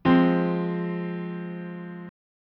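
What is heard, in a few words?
F minor lower, chords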